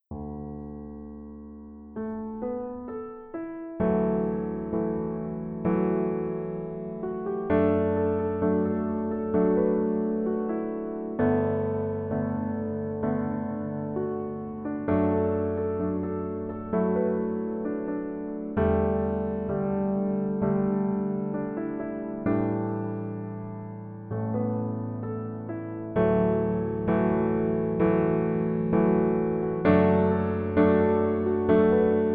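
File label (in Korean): Piano version 멜로디MR 남자키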